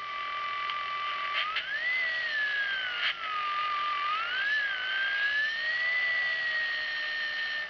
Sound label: alt whine